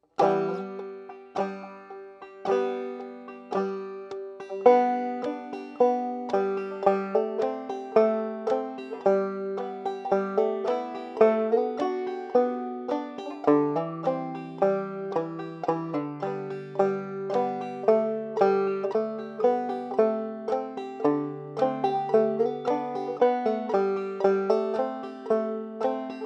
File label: slow; Christmas